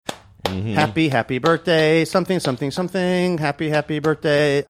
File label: singing happy happy birthday something something something